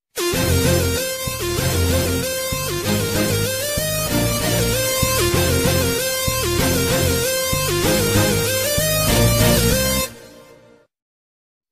bgm beat bgm movie bgm; manmadhan

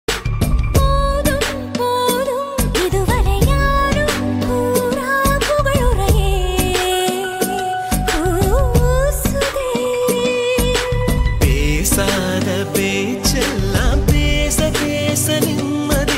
melody song, tamil

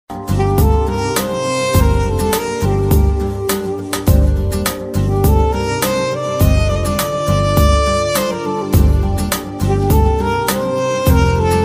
movie bgm ringtone trending ringtones; aadhavan

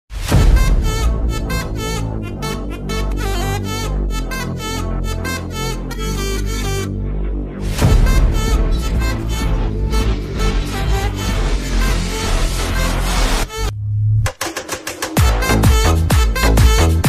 song ringtone; aranmanai 4

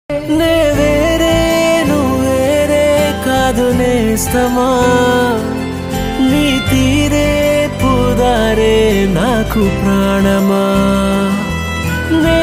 song music telugu songs

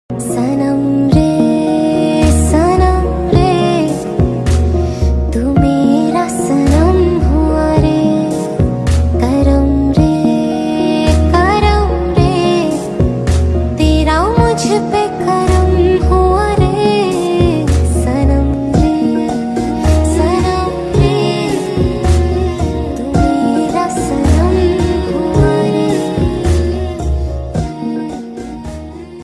female version song cover

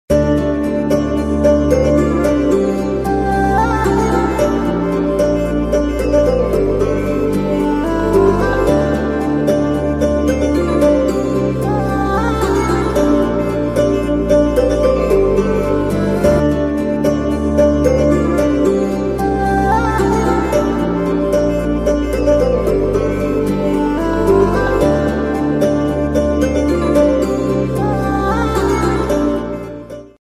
song music ringtone telugu bgm music

